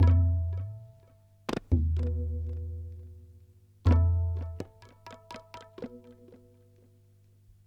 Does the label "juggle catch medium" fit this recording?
No